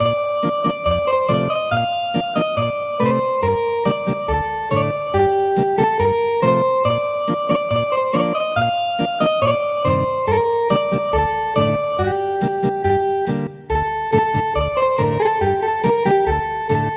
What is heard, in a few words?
Ethnic Guitar; Ukraine has not yet perished